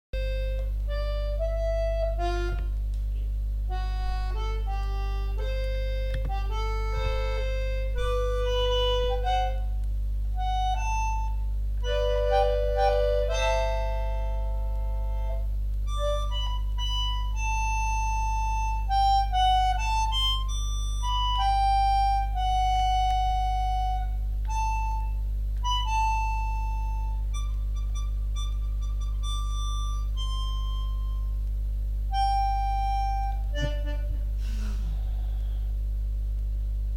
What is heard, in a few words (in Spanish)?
acordeon